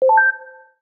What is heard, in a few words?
menu select reverberant beep; confirmation sound; stuart duffield